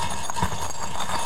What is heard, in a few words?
reel spin loop; dark king